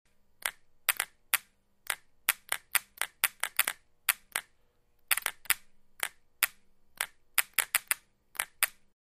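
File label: Solenoid On off With click, buzz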